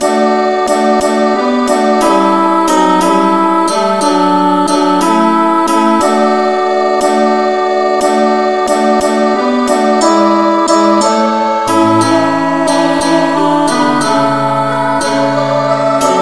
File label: revnovocal key d